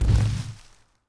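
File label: tree slide large; objects; Star Wars Obi-Wan; XBOX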